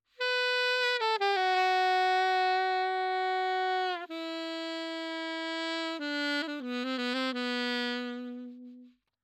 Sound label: Sax